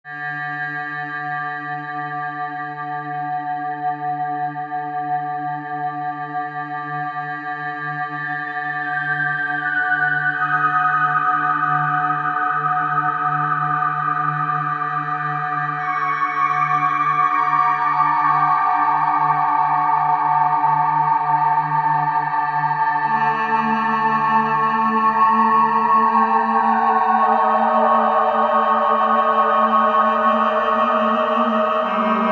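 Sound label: hybrid bell atmosphere; porphyra hybrid; ocean swift synthesis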